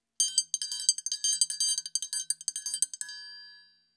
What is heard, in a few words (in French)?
Triangle biguine